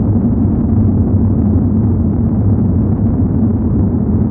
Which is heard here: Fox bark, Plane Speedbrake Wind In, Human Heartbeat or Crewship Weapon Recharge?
Plane Speedbrake Wind In